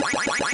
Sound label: fright